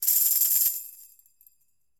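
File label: Tambourine C FX; Latin Percussion; Drums; Drum Hits; Ableton; Factory Packs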